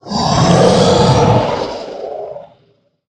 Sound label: Mana Giant Play